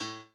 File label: piano o4a